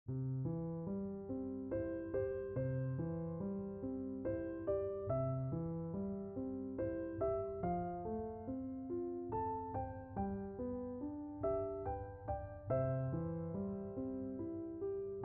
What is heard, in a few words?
Lullaby C Major, piano; 4 lullabies for piano